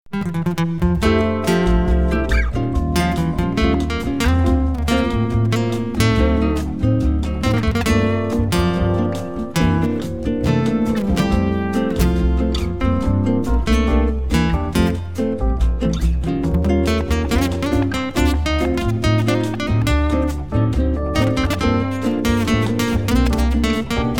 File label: Bossa Nova Backing Track in F Major 140 bpm